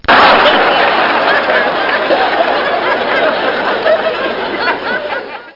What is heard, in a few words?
open; EyewitnessBirds